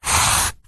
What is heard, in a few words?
office pencil draw line on paper; zapsplat